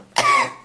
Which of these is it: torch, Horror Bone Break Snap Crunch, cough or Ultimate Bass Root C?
cough